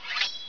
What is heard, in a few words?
sword